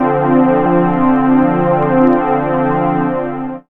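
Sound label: string synth; E-Lab; X Static Goldmine 1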